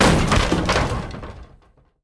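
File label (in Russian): грохот упавших дверей выбитых ногой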